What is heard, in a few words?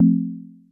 chime flat